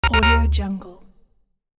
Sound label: interface accept screamy short; interface sounds